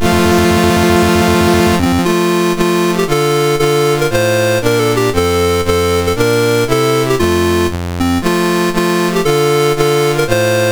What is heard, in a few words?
Tunisia, National Anthems; MOS6581R4; Dave Marquis